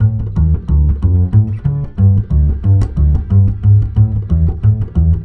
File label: BASS, Balkanish Traditions